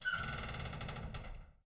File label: door rusty; angband